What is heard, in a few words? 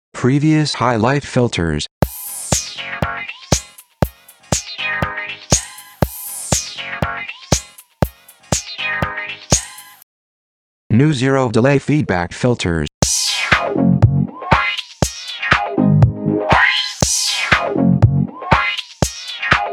zdf filters; highlife 13